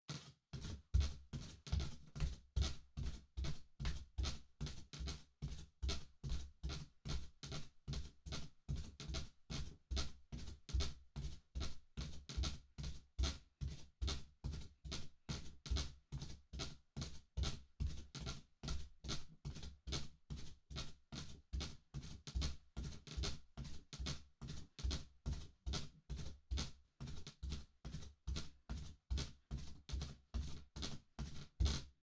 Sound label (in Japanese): 並んで歩く 高速, 裸足 足音; 3DIO